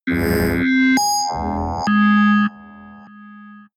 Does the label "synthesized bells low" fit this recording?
Yes